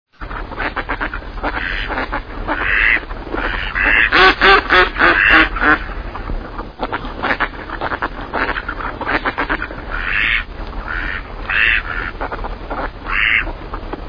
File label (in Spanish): canto Anade Real